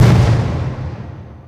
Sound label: FX HIT, Techno Trance; Ueberschall